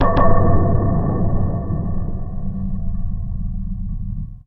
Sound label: Industrial Sms Tone